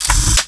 reload, paintball; paintballav

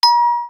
bell; openspace3d; kinectdemo

